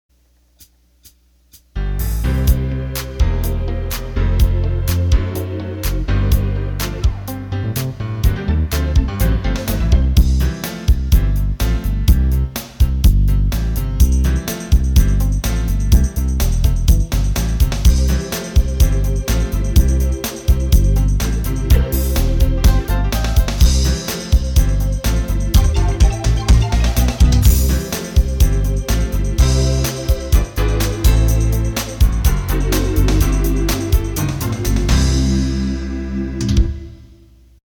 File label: Gospel